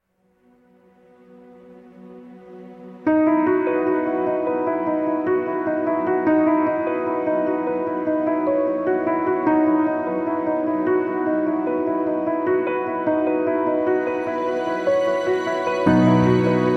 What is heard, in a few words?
instrumental without drums percussion backing vocals